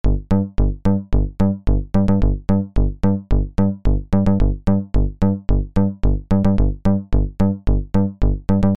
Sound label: Bass; PatchFactory